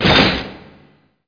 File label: clank; outlaws